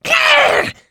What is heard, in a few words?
pain; Left 4 Dead 2; jockey